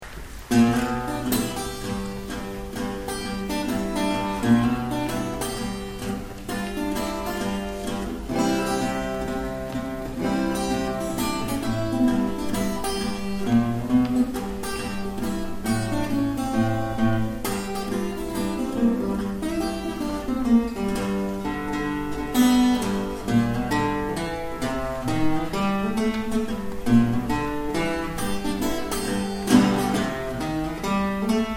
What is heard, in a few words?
Blues in G